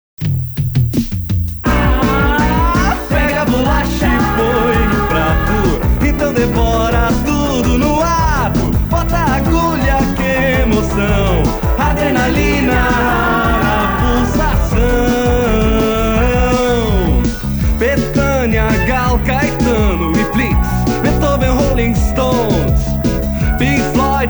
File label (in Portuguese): Jingle; Imcosul Discos; IpanemaFM; Agencia Jinga